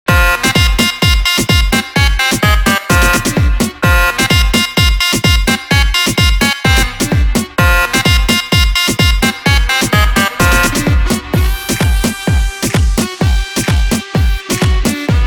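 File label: HOUSE INTRO BPM 128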